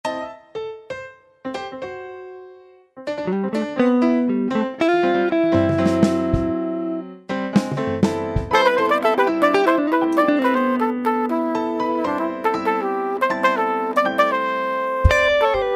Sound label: MUSIC SAMPLES Jazz